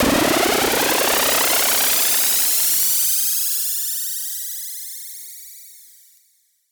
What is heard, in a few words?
Uplifter